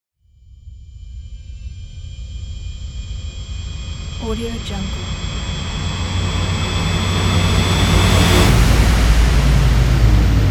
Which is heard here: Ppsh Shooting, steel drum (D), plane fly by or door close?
plane fly by